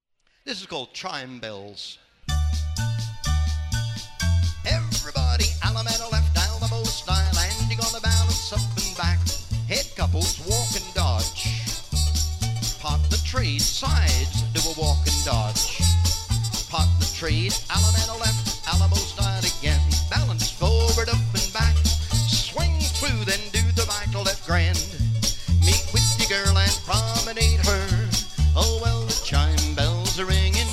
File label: chime bells vocal; square dance music